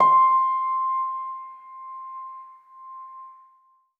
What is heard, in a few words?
KEYS Felt - C; Synth One Shots